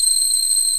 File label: HIGH STRINGS